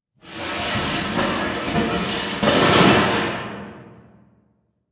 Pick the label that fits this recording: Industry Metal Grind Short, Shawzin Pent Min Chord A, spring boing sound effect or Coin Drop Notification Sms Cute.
Industry Metal Grind Short